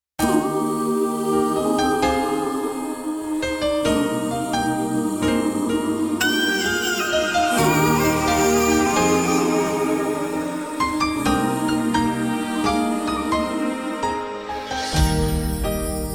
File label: Inst BVs